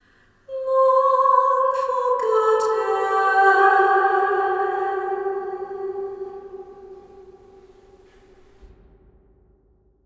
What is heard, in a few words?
Woman Singing